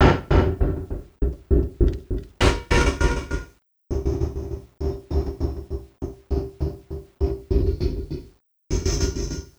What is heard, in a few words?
Envelope Beat; Drums & Drones